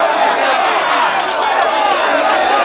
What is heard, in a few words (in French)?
Combat